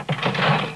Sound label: Las Vegas Program Open Close